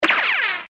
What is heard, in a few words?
cartoon run dash